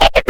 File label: Scratch; Digital Sound Factory Xtreme Lead